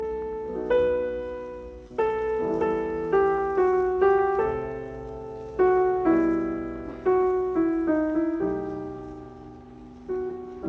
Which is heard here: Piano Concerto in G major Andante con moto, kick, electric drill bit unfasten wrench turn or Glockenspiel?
Piano Concerto in G major Andante con moto